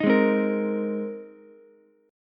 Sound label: Ab Major Add 9th, Ukulele; strummed; 8,8,8,13